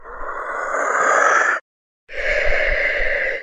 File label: Devil